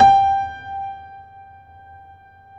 PED FF, GRAND PED

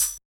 HH, Yamaha DJX 2B

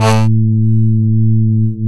FM SYTH; NorthStar - The Wizard VOL-2; TURBOTRONIX

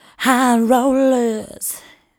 VOC, Disco Fever VOCALS; Best Service ProSamples vol.48 - Disco Fever; AKAI

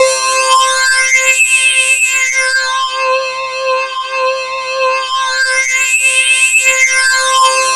CLAS LEAD, JV-1080; Syntec; Wall of Sounds

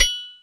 Mt Bel, Percussion

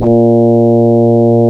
A#, Synclav FM, BRASS; Big Fish Audio

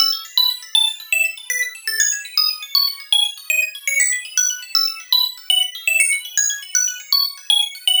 Synth Arpeggio, Synths; Eclectic Electronica

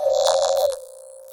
scan

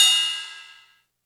Cymbals, Elektron MachineDrum SPS1 MKII

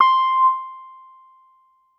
Piano Clav c5 hard; TrashLibrary

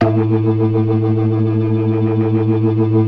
ORGAN, 4 DRAWBARS; Keith Emerson Signature Series

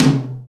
MID ROOM TOM, Ensoniq ZR-76; 300 Drum Machines

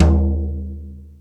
Jazz Drums, E-MU Pro-Cussion

